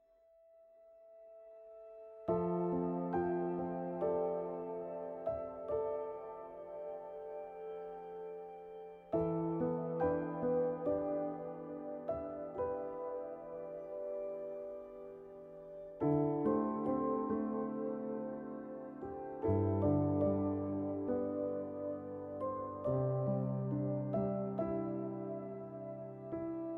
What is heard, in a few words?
bgm; jikoji